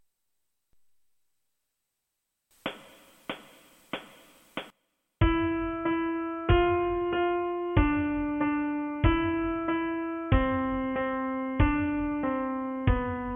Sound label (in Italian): piano unite